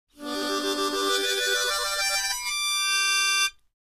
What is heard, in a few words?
Mouth-organ, Vibrato Glissade, Type 2 - Ascending, Tonic Chord; woodwinds